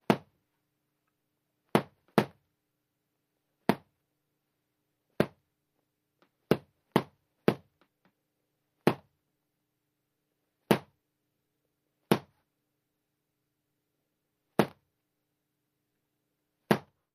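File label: Boxing Gloves Hitting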